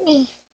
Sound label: Gag; Lewd SFX